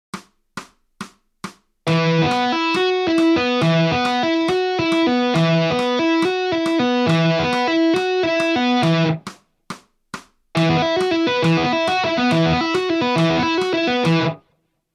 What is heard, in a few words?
Lick